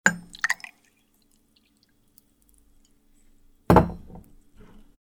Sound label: Pour the liquor into a shaker